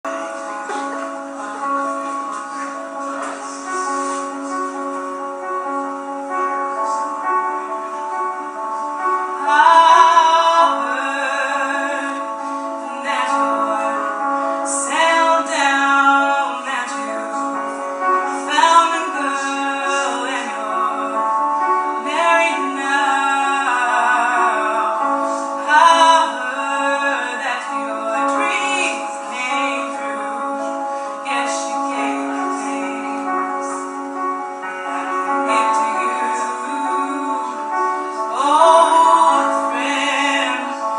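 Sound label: [F] [cover][singing][belting][open mic][piano]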